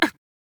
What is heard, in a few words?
Grunt Attack; Paladins; Huntress